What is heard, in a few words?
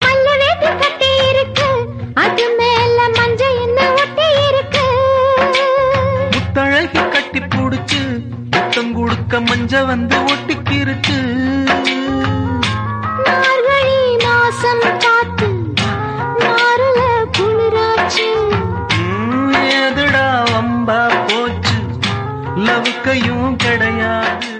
Tamil Song Ringtone; Mudhal Mariyadhai